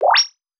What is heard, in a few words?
line Link; LinkGame; LuaDemo; Gaming